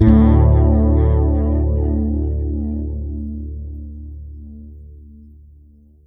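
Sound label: major double D, Pedulla bass; Masterbits CLIMAX 7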